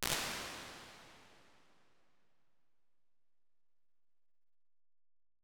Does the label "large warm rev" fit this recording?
Yes